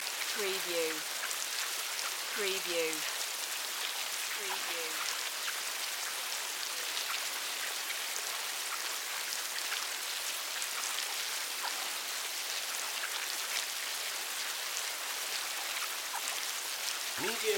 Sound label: Larva Meal Worms; sound-effects-bible